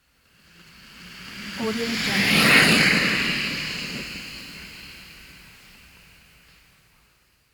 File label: passing by